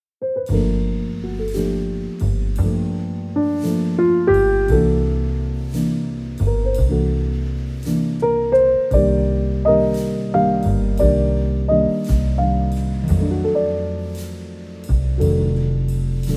podcast soothing serene smooth jazz romantic cafe vibes